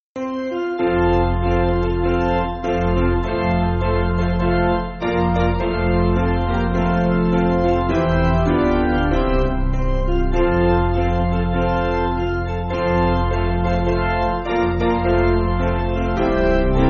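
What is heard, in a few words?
Piano, Music Backing Files for Churches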